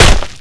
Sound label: sand king attack; dota